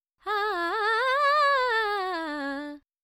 Dry 4-4 D 100, Vocals Female; Sample Banks

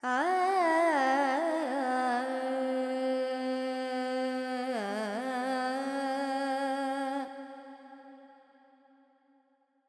Carnatic Female Alaps, Panthuvarali, 100 G, FX; Sample Banks

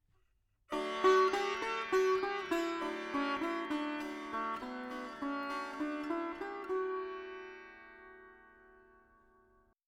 4 4 D 100 Dry, Melody Veena; Sample Banks

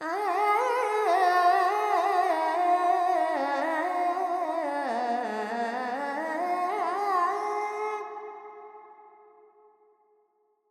Verb Dorian, Vocals, Carnatic Female, Alaps, 70 G

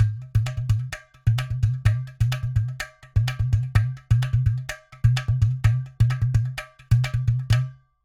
Loops 128, Percussion, Ghatam; Sample Banks

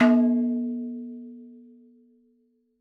madalam notes L; MADALAM 110 7 8; Sample Banks; Percussion; MADHALAM

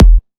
Kick Em; Sample Banks; Percussion

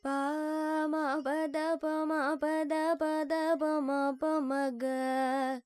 Charukesi, Carnatic Female Swaras, 100; Vocals; Sample Banks

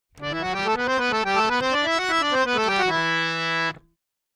Harmonioum, Em 3-4 128, Dry; Melody; Sample Banks

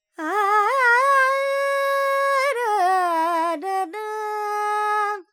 Charukesi, Vocals, Carnatic Female, Alaps, G, Dry

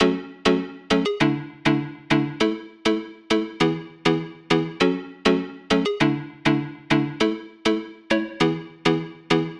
Pluck Pop F#m 100; Melody; Keyboards

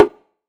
Perc; Sample Banks; Percussion

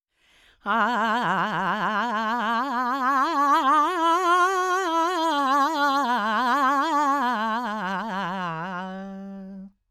Dry 4-4 G 100, Vocals Male

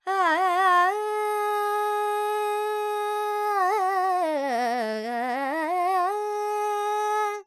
Dorian, Vocals Carnatic Female Alaps 70 G Dry; Sample Banks

